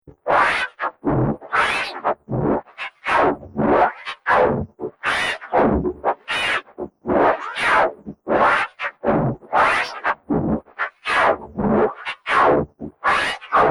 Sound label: 120 Bpm Mechanical Slide Loop, Robot; Looping Sound Effect; Fantasy, Horror And Sci-Fi Games